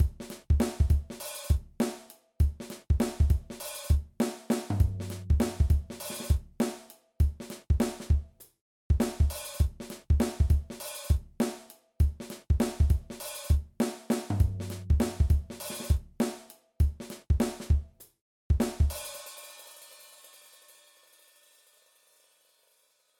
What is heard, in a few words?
Groove Groovepattern